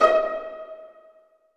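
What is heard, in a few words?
D#4 pizzicato, strings; AKAI S-Series CD-ROM Sound Library VOL-8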